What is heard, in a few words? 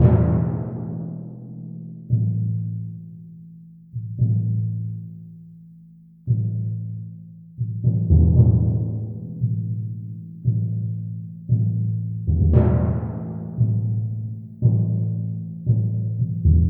timpani; литавры звук для средневековой сцены